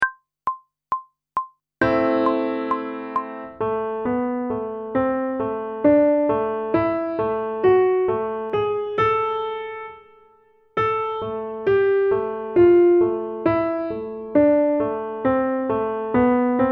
Intervals of a Melodic Minor Scale one octave ascending and descend, pop vocals; grade 7; Technical Work